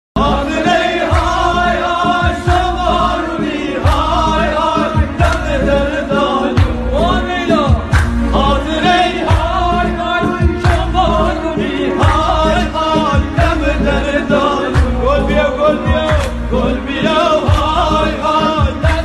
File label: Shoye Barooni (Guitar)